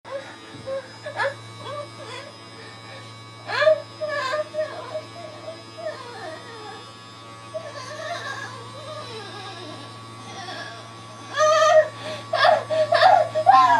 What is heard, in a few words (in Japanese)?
えち音声